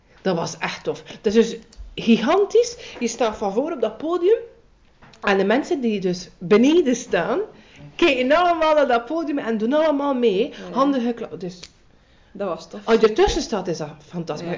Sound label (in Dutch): Zingen